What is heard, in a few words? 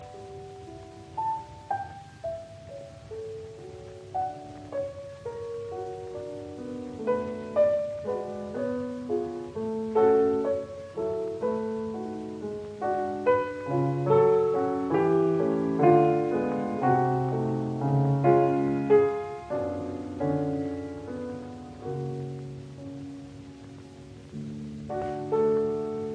Piano Pieces Intermezzo in B minor; 4 Piano Pieces Op 119 No 1; Wilhelm Backhaus